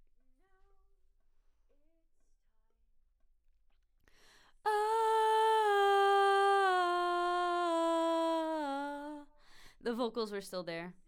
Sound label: BG Vox